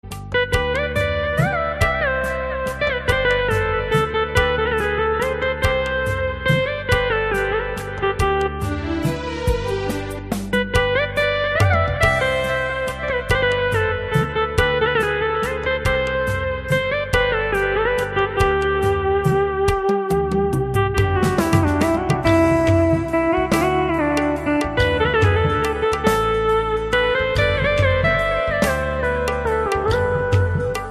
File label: instrumental ringtone